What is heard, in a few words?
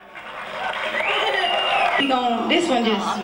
85 bpm; 90 s Sample CDs; Planet of the Breaks